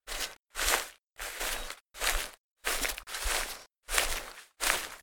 Footsteps Grass

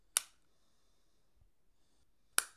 Light Switch Click

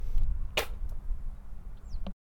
Spit

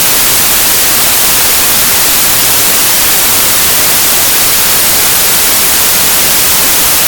Static

